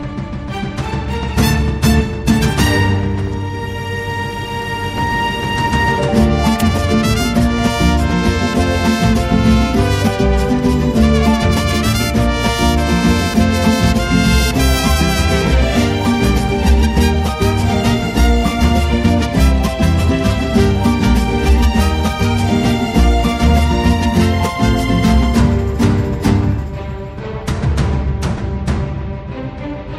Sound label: Mariachi; F1 Theme